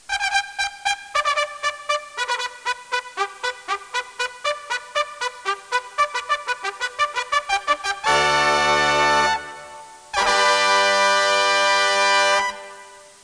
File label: fanfar; mgxshow